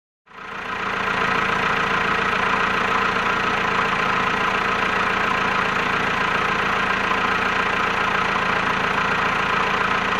Dump truck on construction site idle

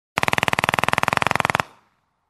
Small machine gun sound

